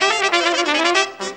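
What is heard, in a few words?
clarinet trumpet; Best Service; World Colours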